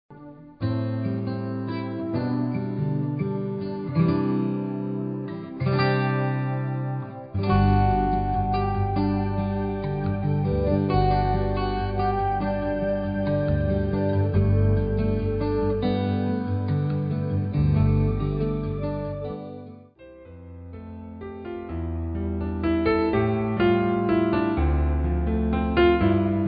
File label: Relaxing Music; on hold message music